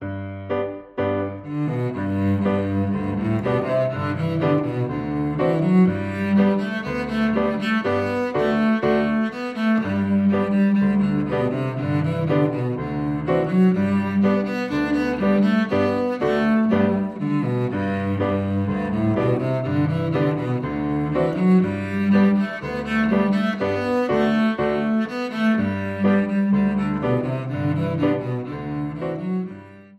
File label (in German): irisches fiddle lied; hoerversion